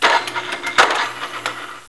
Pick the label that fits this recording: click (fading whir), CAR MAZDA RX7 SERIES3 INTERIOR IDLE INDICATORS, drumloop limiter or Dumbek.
click (fading whir)